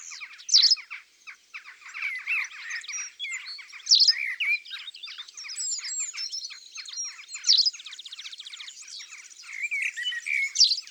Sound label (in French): Bergeronnette grise; ABC de la nature